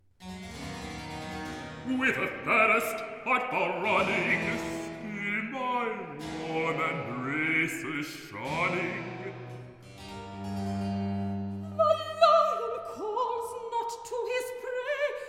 Recitativo; Whither, fairest; Handel Masterworks

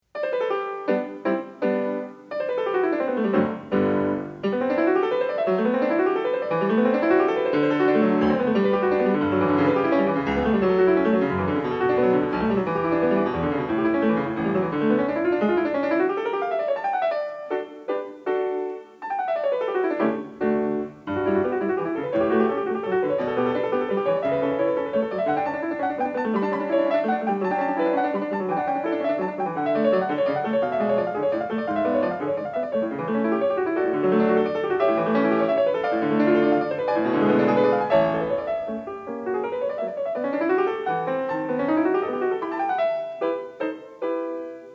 Partita for keyboard in G major, Praeambulum; Bach, Johann Sebastian; Partita for keyboard No. 5 in G major, BWV 829- Praeambulum

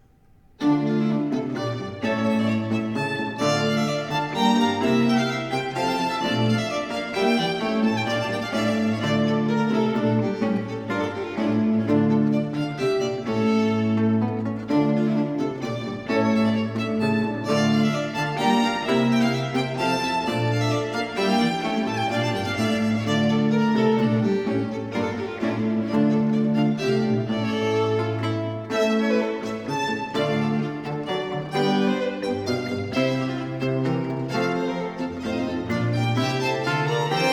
Sonate 7 in E minor - 2. Allegro; Arcangelo Corelli; Corelli - Complete Works; Klassika

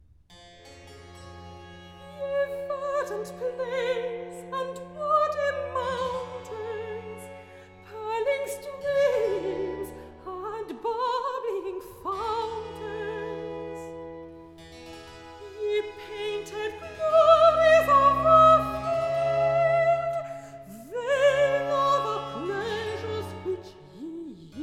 Recitative; Ye verdant plains; Handel Masterworks

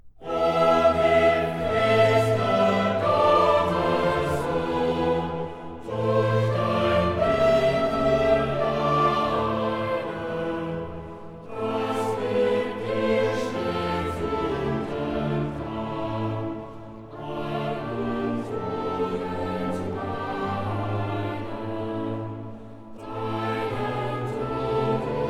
Chorale; O Hilf, Christe, Gottes Sohn; Klassika; Bach, Johann Sebastian; Choral Works; Johannes Passion BWV 245

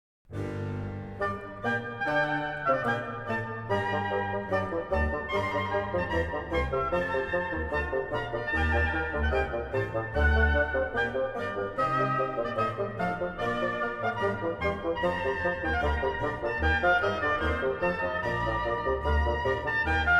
Organ Concerto in G minor - Gavotte; Op.7 No.5; Handel Masterworks; Klassika

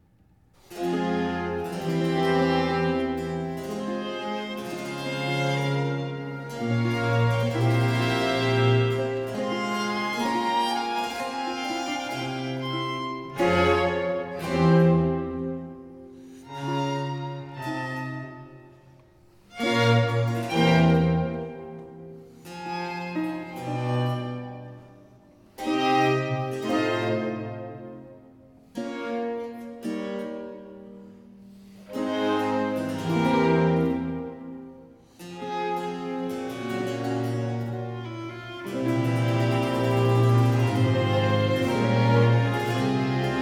Concerto 5 in B flat major 4. Largo; Arcangelo Corelli; Corelli - Complete Works; Klassika

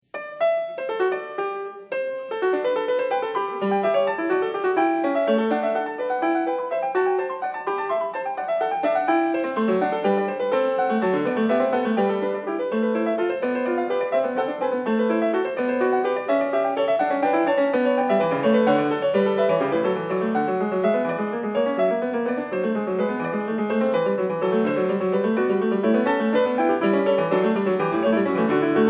Partita for keyboard in G major, Gigue; Bach, Johann Sebastian; Partita for keyboard No. 5 in G major, BWV 829- Gigue

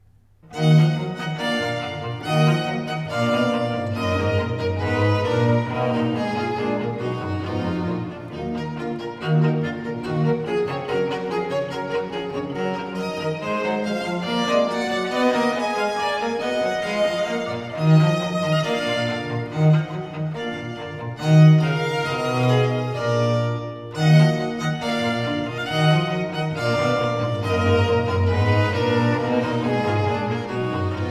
Sonate 9 in F minor - 4. Allegro; Arcangelo Corelli; Corelli - Complete Works; Klassika

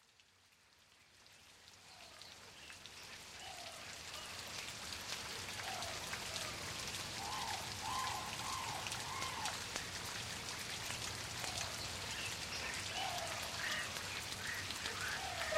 Rain Forest Retreat, The Sounds Of Nature

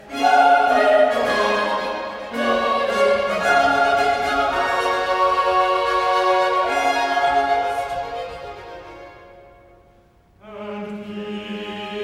Chorus Glory to God in the highest; Handel Masterworks; Klassika

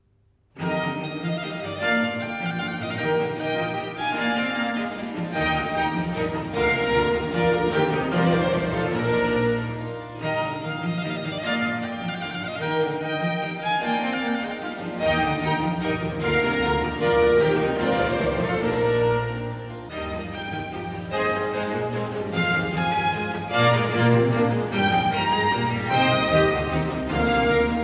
Concerto 12 in F major 4. Sarabanda, vivace; Arcangelo Corelli; Corelli - Complete Works; Klassika

